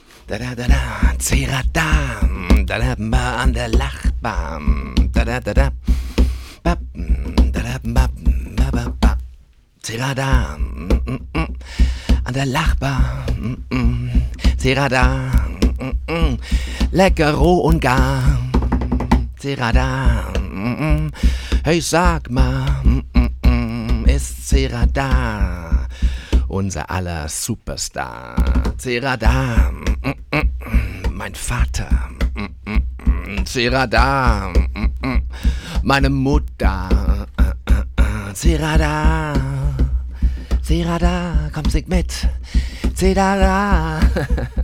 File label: jingle; c-radar